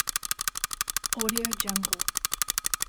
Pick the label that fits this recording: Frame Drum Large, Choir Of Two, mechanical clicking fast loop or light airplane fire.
mechanical clicking fast loop